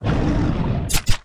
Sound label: Heavy Attack; Kyllius; Blind Quest - The Frost Demon